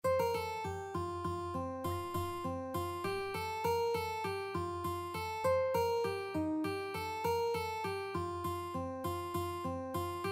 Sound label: Mooncoin uke